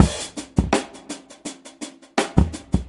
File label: Drum loops, Boombap